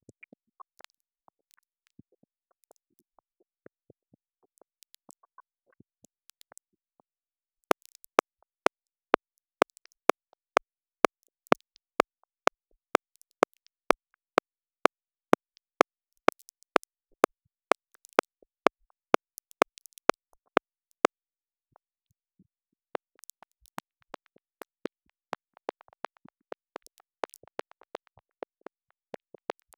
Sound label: poor noise